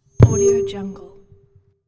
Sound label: drop the mic